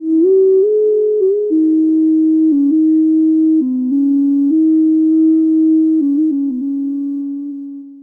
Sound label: musician song of mourning